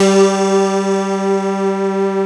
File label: BREATHY G2